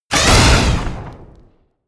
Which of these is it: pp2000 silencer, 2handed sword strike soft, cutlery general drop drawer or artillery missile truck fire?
artillery missile truck fire